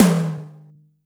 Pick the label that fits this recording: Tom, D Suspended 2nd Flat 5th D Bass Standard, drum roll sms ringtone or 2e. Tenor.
Tom